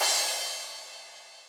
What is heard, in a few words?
crash, Zoom MRT-3B